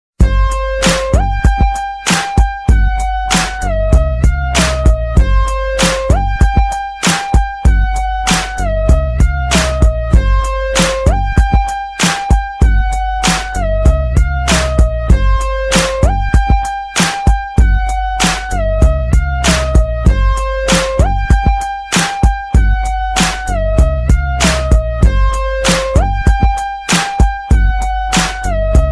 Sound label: Instrumnetal